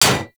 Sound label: Bullet Impact Generic Enemy Shield; Warframe; Weapons; Bullet Impacts; Surface